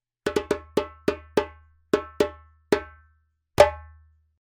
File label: djembe; djembebog oevelse